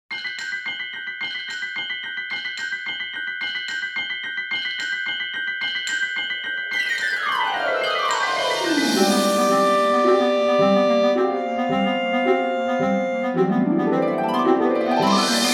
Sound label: Crash; Animal Crossing Wild World